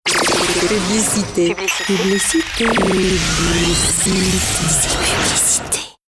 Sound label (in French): Generique publicite, voix chantees